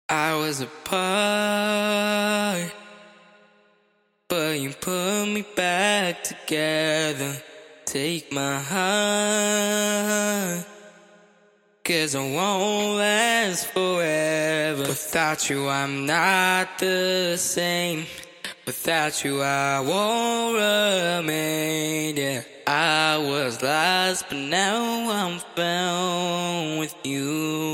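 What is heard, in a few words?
Elec pop, 128, A sharp minor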